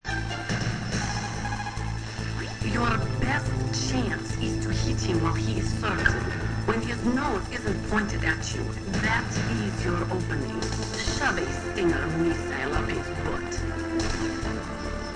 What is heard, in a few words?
stinger; metal gear solid